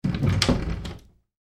household door double glass panel internal open, Wooden Glass Panel Double Door Open; Doors Open - Wooden Glass Panel Double Door Opening; Sliding Doors; McKinneySound